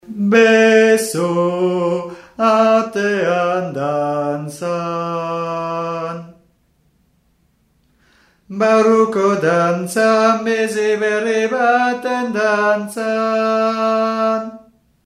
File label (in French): hommes